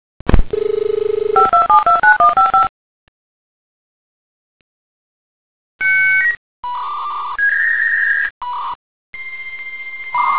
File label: modem